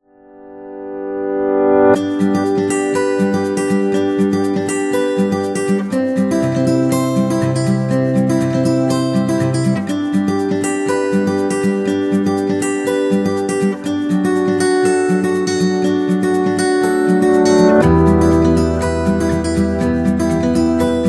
Acoustic Guitar Optimistic; happy music vol 6

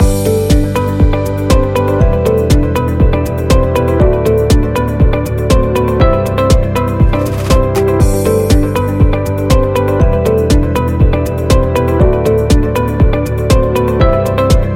Corporate Loop Positive Beat

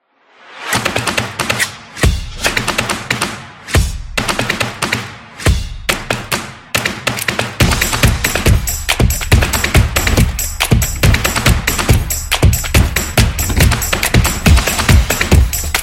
Upbeat Drums; stomp and clap

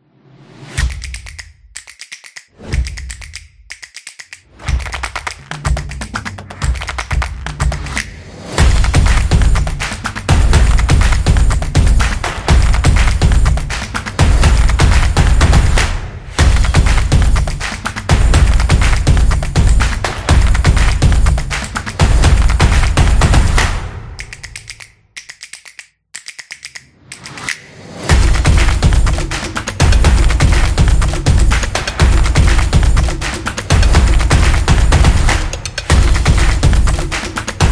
Percussion Action Upbeat Drums; percussive nation vol 7

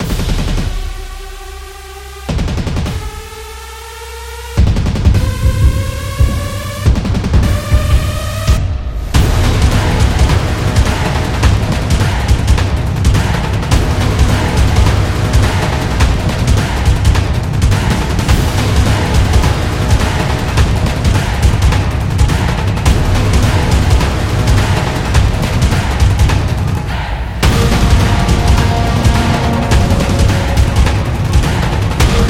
Sport Epic

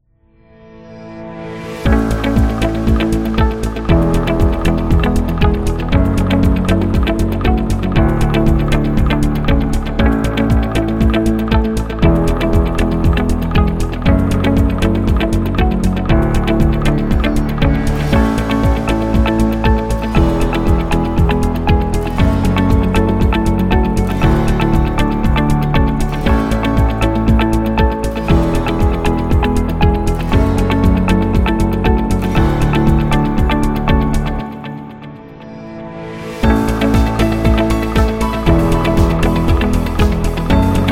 Upbeat Positive

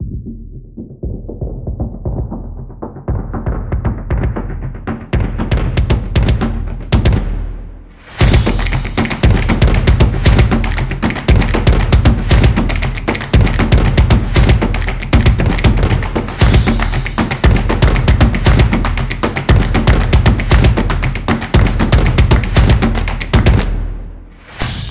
Energetic Promo; drums trailer

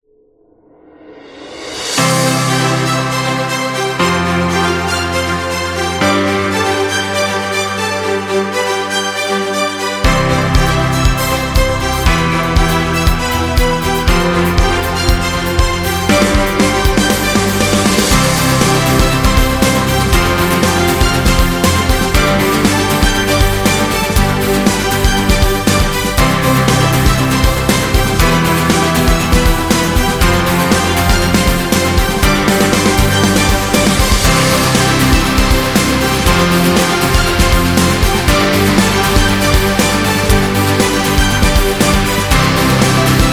The Positive; corporate music vol 19

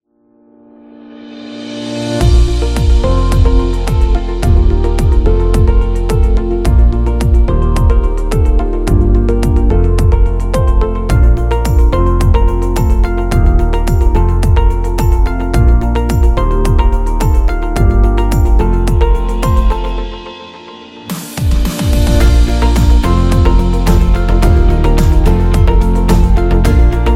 Upbeat Corporate Music

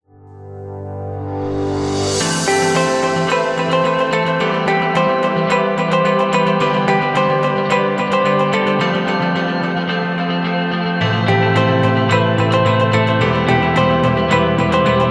Elegant Background, corporate music; corporate music vol 15

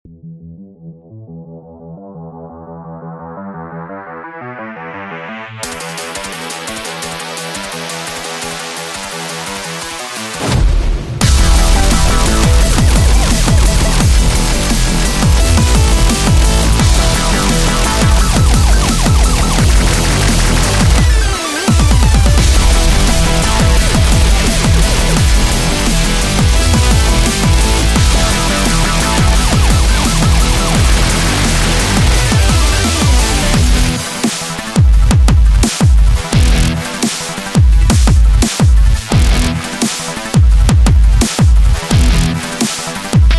A Dubstep; epic sport metal

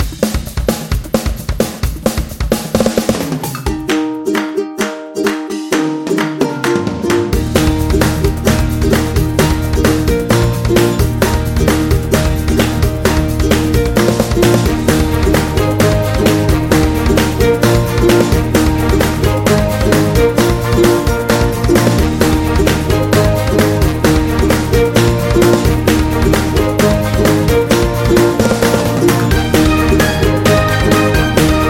Happy Upbeat Folk, happy music

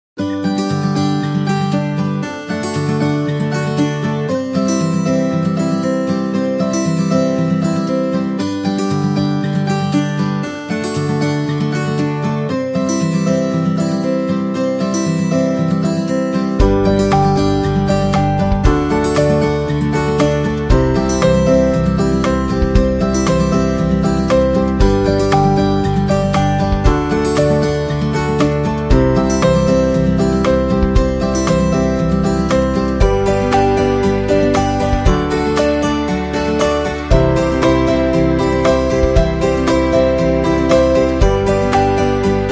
Romantic Guitar; wedding music

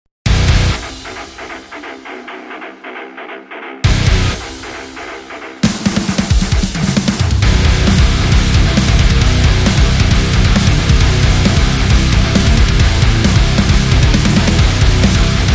For The Metal

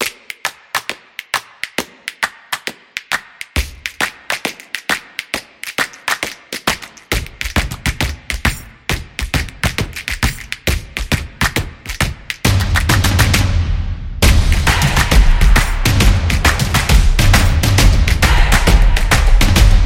Soul on Wax Opener

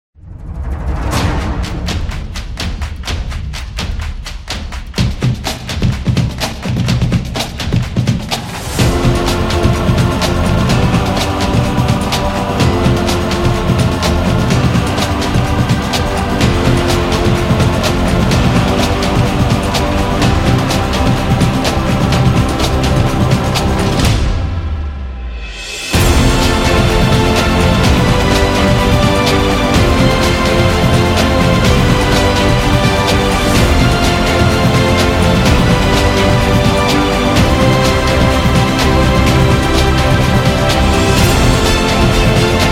Sports Action, epic music; epic music vol 3

